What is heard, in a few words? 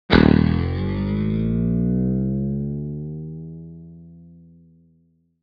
Electric guitar hit on strings